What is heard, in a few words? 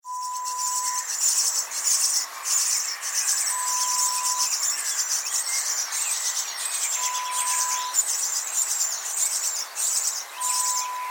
Birds nest sound